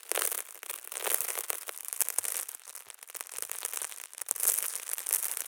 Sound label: salt; flow